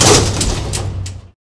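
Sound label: elevator, tones; Counter Strike Model Pack; CS:GO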